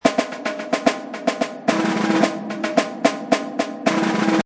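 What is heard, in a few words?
Orchestral Snare Kit 110, Orchestral snare loop 110 bpm; Drum Loops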